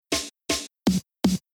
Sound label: Rhythm DnB SD